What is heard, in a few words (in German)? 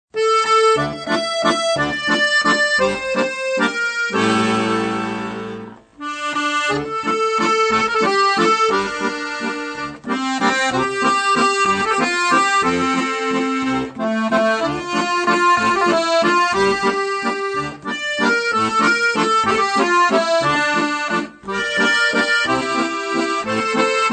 die Basstonleiter, Der kurze Vorschlag, Akkordeon; Akkordeon Workshop Band 2